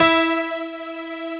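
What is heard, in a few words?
synth E, STAB, House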